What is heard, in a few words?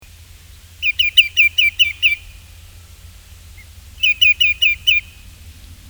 Tringa nebularia